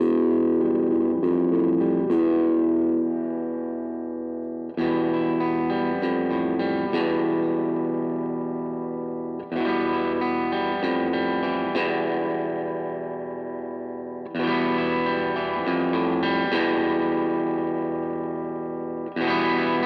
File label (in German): Harley Benton Vergleich HB Tone; Maybach